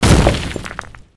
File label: concrete break